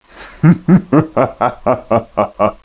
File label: laugh